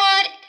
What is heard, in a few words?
vocal